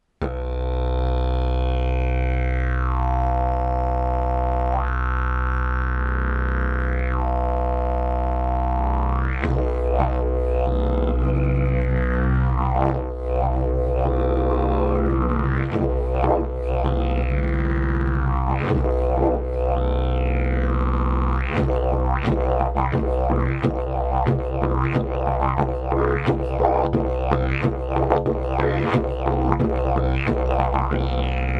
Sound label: didgeridoo; javor jester